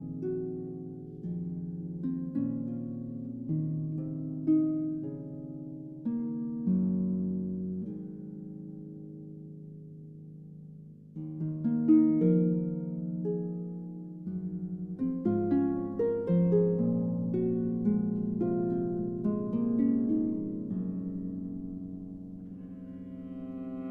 Harp Concerto Lullaby

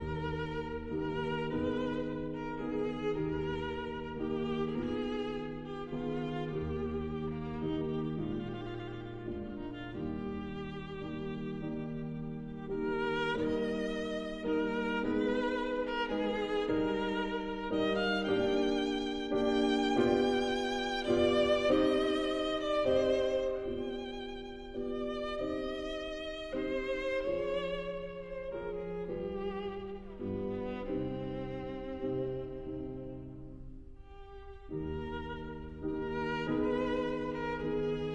Sicilienne for violin and piano

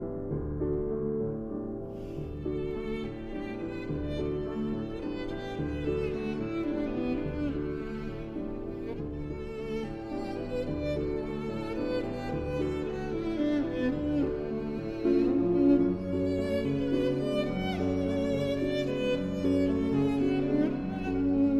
Violin and Piano in D

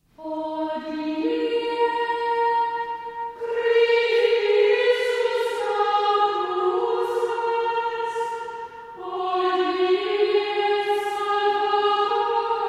Chant- Antiphon; Traditional (Chant); from Chester Processional

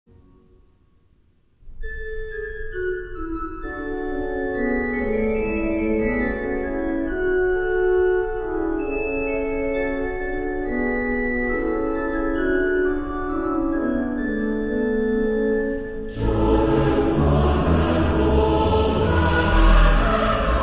Cathedral Choir, Carol; The Cherry Tree Carol

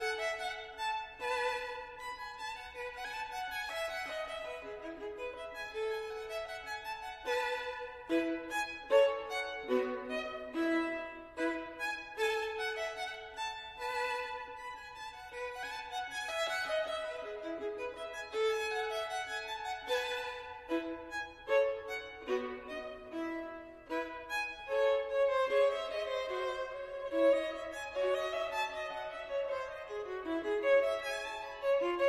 Gavotte en rondeau, Violin

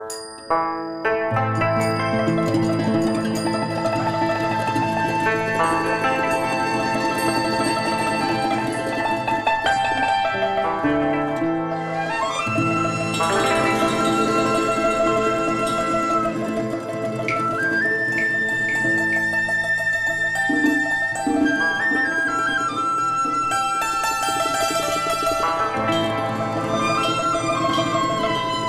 TRADITIONAL Chinese, Picking Flowers

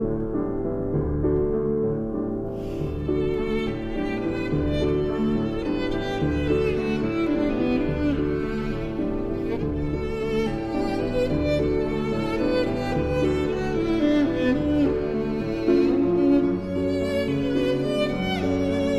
Violin and Piano in D

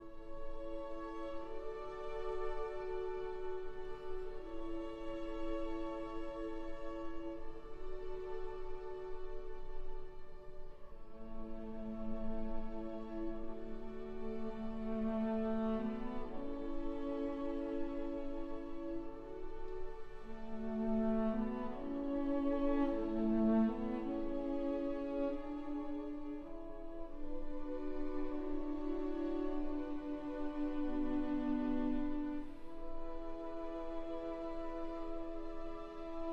for cello and strings

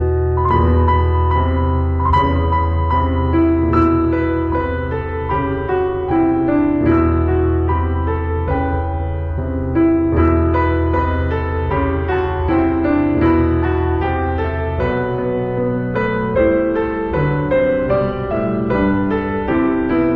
piano 4-hands; Debra Torok & Marylene Dosse; Christmas

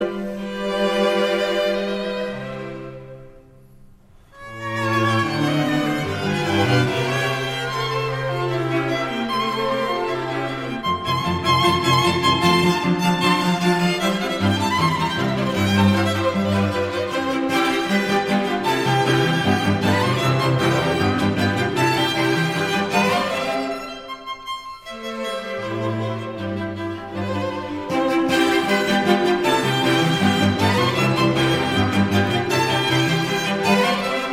String Quintet in C; Wolfgang Amadeus Mozart; String Quintet #3; K 515

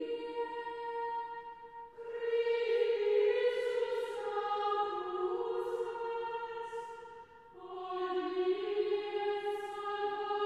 Chant Antiphon; Traditional; Chester Processional

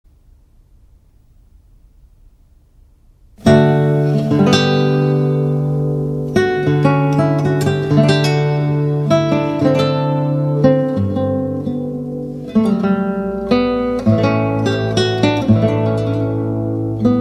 Cello Suite No. 1- Sarabande; Bach, Johann Sebastian

